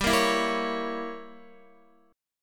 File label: G Diminished 7th, Guitar; strummed; x,10,10,9,10,9; 4F,4A,5C,5G,5C,6E; JGuitar